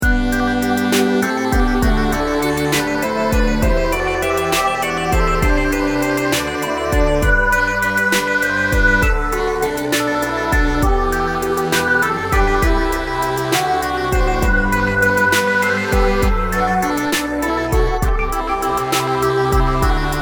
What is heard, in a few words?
ringtone; schulhymne; Filmmusik und Klingelton